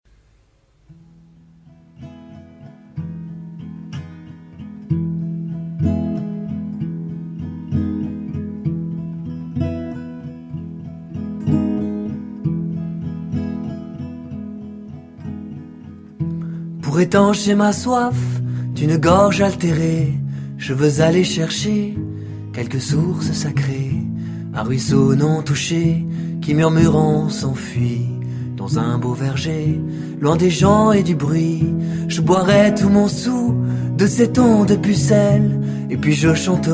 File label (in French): hymne